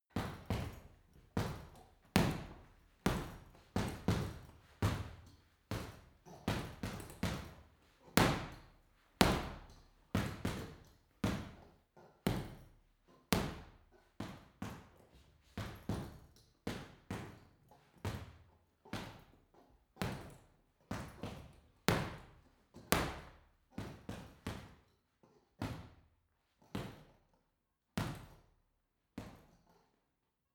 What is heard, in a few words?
boxing punching bag boxing sequence reverberant; sport-freizeit; boxen